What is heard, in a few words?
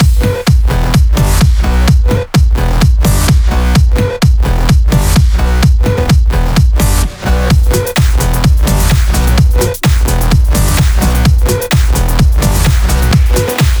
128BPM Mix; Vengeance Samples; VFH2 128BPM Amplify Kit